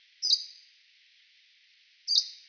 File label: bird, ambient animal; Counter Strike Model Pack; CS:S